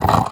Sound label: vox grunt misc, zombie